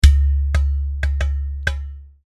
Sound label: drum strokes